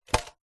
food noodle pot dried plastic set down; zapsplat

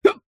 human male hiccup

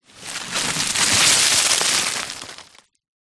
foley dirt collapse fall roll small pile; zapsplat

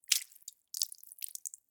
Gore Drip Spill Splash; Just Gore 2; soundbits

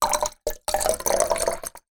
food drink water pour into flask short trickle; zapsplat

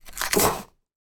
household teaspoon dig into coffee in jar

